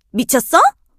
hurt vo, kr; melodie

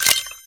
reload

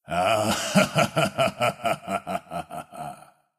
laugh; 潘森（英雄联盟）; 不屈之枪

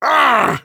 Orc Cleaver hit; 普通兵种

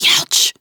hit; 阿斯拉（KRL）

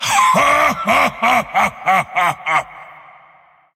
laugh; Volibear

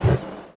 attack effort; Lich

